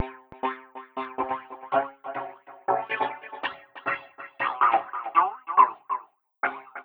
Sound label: Envelope Beat; Drums & Drones